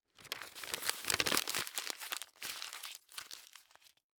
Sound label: Household Paper Crumble Printer Paper